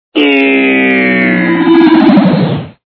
buzz extend; Together in Jesus - First Eucharist - Computer Fun

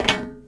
metal; ambient

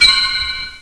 chink; Atari Mega Archive

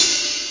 hihat; Groovy Bytes: Behind the Moon; BAS PERC

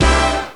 asterik; Jazz It Up

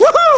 WAHOO; Spanish Net

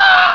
effect crow; Hero

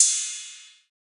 808 CYMB

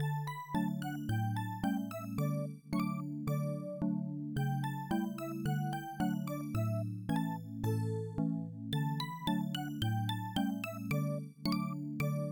GAVOTTE

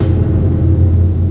thump; Quake; PainKeep; PC Gamer 46

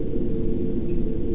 open wind; Severance

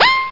Ping; DINOKITTY

